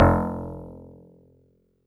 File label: Old Banjo DX100 F1